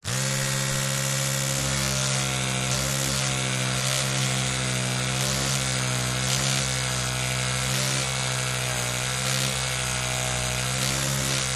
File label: electric toothbrush on run dry brushing off close up